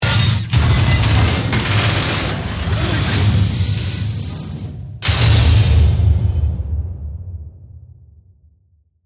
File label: Mechanical Transform, Transforming, Robot; Imaging Element; Accent; Transition; Spacecraft; Production Element